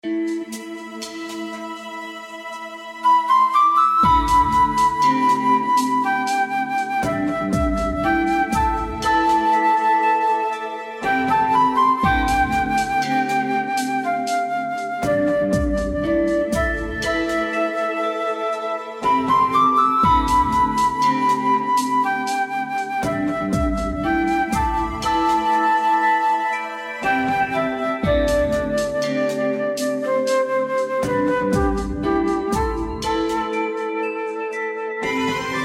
battle; Android

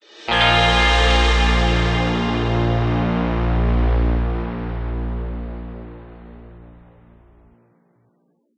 stinger positive; fort onboarding; fortnite

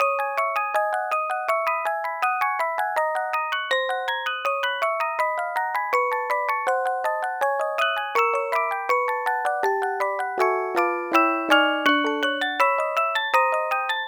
Music Box

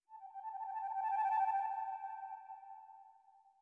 flute trill; Sky Children of the Light gamerip

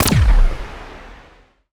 Implode

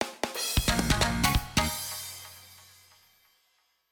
Jingle Start; Choju Giga Wars; 3ds ps4 switch

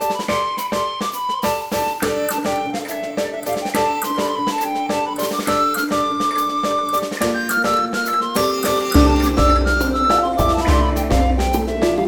Nest Dungeon normal end; vivid knight switch windows gamerip 2021

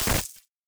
Glitch

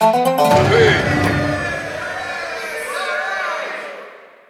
Battle Win; predynastic egypt gamerip 2016

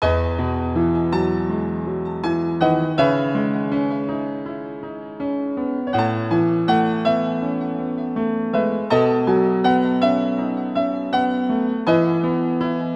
Piano Ver.

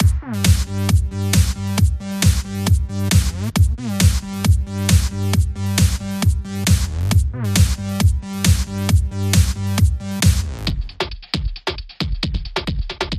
The Party Starts Now (Instrumental); club penguin

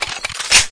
Weapons Rocket Launcher

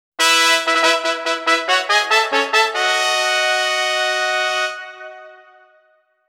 Battle Start; Kyou kara Maou Hajimari no Tabi; PS2

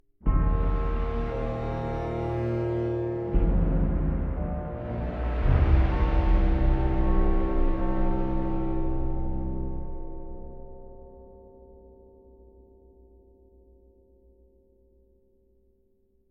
String; assassin s creed unity gamerip 2014

